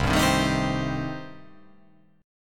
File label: C Minor 6th C